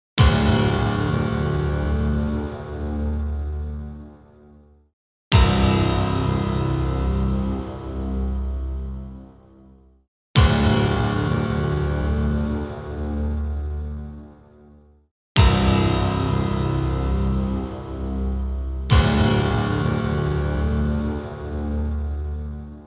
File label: Zarb; Vocal&Effect